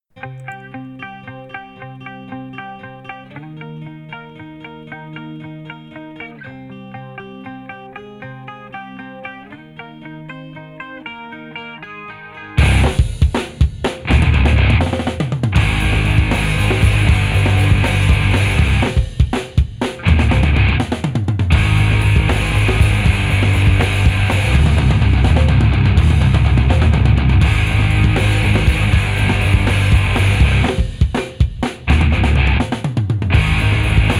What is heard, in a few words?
apple earpods no eq